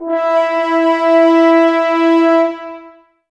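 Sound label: Brass French Horns E; Sample CDs; Miroslav Vitous; Woodwind Brass Ensemble; F.Horns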